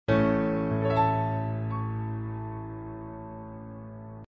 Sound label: Grand piano; Piano app by Yokee